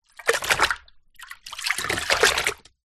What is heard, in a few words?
household mop movement in plastic bucket water; zapsplat